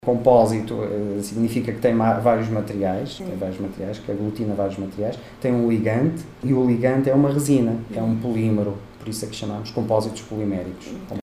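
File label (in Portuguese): compassos polimétricos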